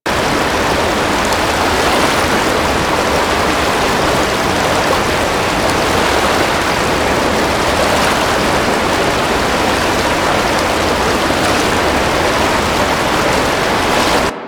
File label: Water Cascade Medium Loop, Ambience; Props; Warframe; Duviri